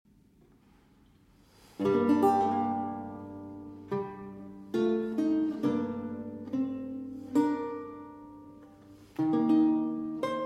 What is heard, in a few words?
Lachrimae, Solo